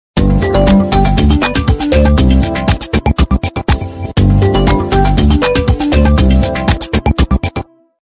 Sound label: nokia ecliptic ringtone